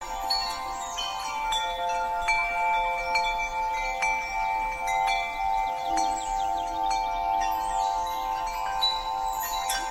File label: baby bells